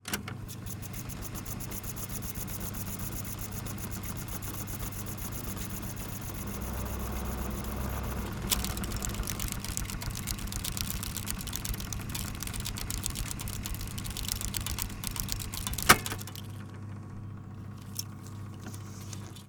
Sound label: Tape Machine, Fast forward, Released tape form the reel; sound ex machina